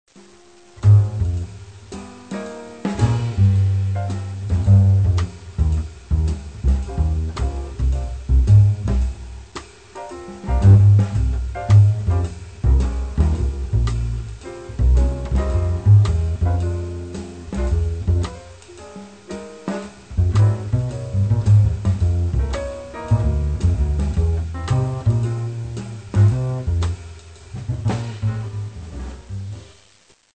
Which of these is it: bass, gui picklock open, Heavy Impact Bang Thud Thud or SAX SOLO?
bass